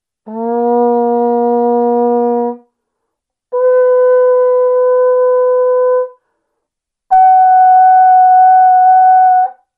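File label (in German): vuvuzela harmonische töne 1 bis 3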